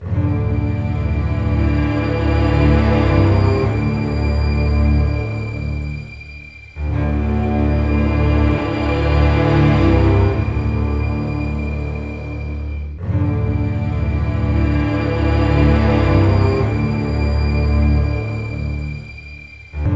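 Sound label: 72 danger, orchestra; East West; Scoring Tools; Danger There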